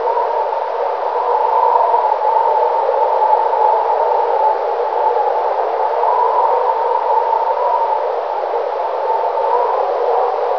cold wind loop; wartso; ambient

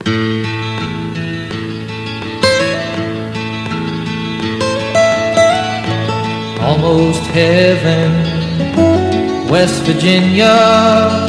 country

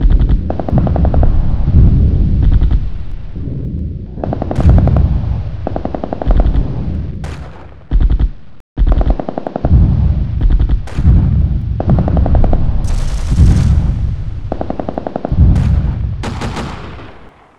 distant firefight

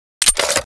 mp5k mag out

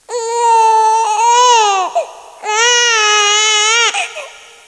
baby

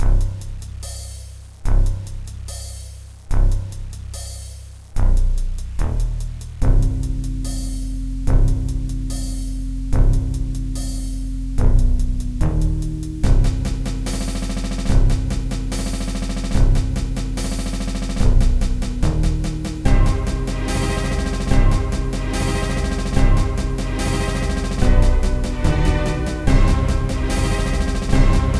it march

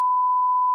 beep

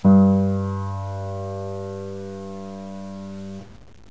piano g

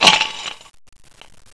glass, debris

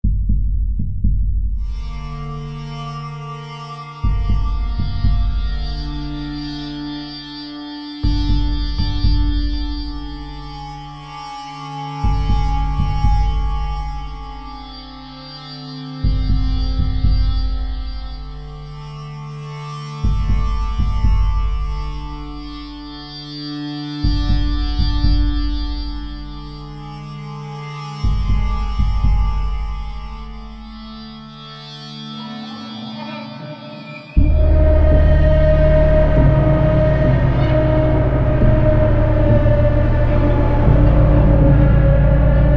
song; Canals

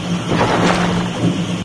machines

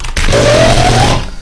turbo

